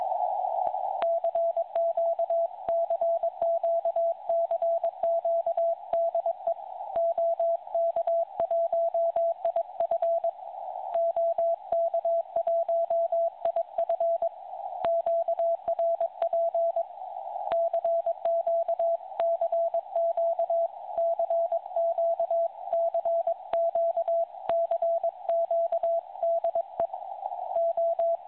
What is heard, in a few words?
uSDX CW; SDR; OK1KPU; Setkání Staré Splavy 2020